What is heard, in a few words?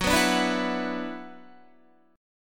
Gb 7th Suspended 4th Sharp 5th Gb Guitar; strummed; JGuitar